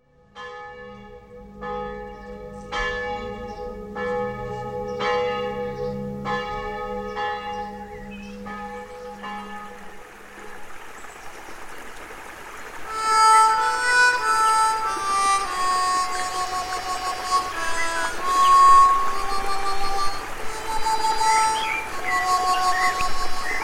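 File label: solo D A, Mundharmonikas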